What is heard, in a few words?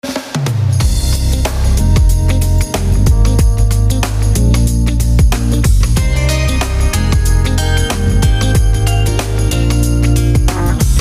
lounge